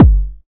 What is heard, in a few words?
kickdrum g key